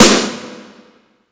Snare Noisy, Yamaha PSR E305

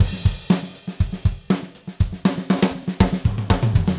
Gretsch groove 120 BPM, 1967 Gretsch Kit drums

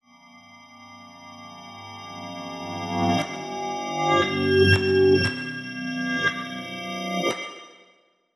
Reversed Keys

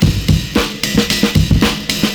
70s Breakbeats; USB Soundscan vol.46; AKAI

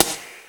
TIGHT SD, DRUMS; Crypton - DrumBase5000; CD DRUMS #2

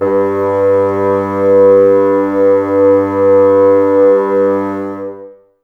BNS G, Bassoons, Wood Winds; Miroslav Vitous - Woodwind Brass Ensemble

